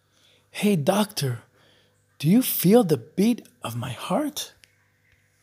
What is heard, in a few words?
beat of heart